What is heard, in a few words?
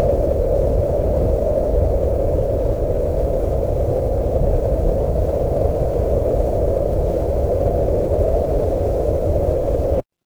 ambience wind